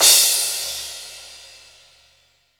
CRASH

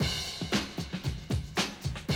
Classic Drum Loops

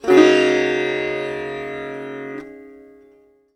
SITAR CHORD; SITAR CHORDS

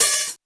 Dry Set; Roland U220

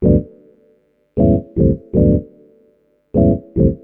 Organ chops (125BPM), retro house

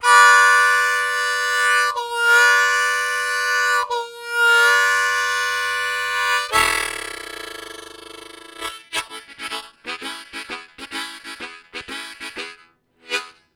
Wailing, Harmonica; Zero G

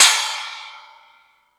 Hip Hop, Drum Machines E-MU Pro-Cussion

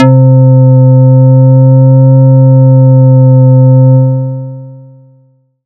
SY TANGO; ModelCycles

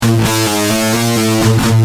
G Heavy, Guitar; Industrial

